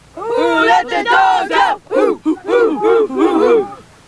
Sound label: cheer